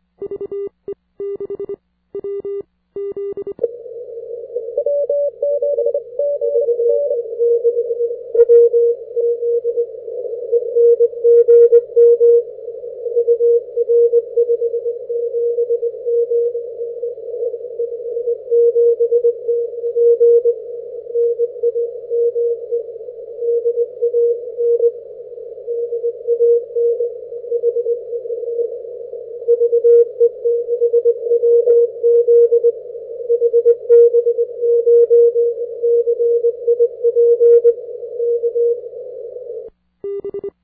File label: 80m cw; HB9CIP